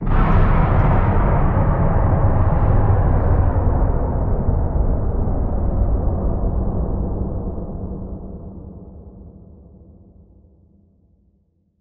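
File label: Power Down Muffled Drop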